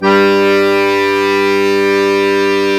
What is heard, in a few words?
Accordians Musete; Club-50 - Foundations Roland